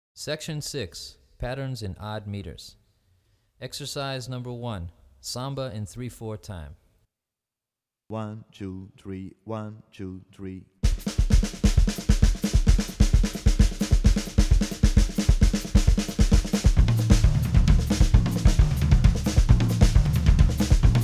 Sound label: Patterns in Odd Meters, Drumset; Brazilian Rhythm For Drumset; DaFonseca, Weiner